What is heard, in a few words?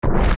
Swell; Barinade; zelda; OOT